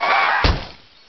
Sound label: Car Crash